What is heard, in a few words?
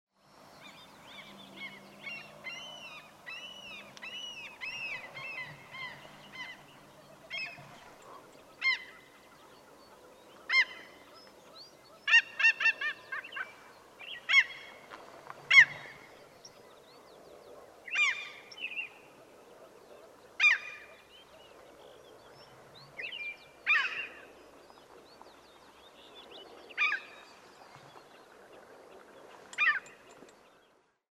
Mew Gull, Larus canus; gulls terns